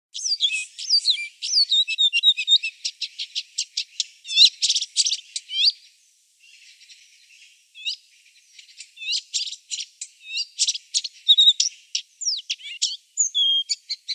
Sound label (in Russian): kamyshevka sadovaya golos